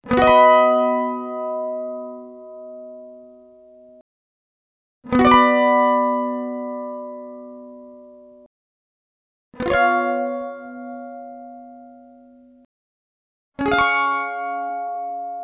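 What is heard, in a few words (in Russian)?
звуки мандолина mandolin chord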